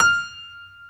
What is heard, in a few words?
StereoGrand, Keyboards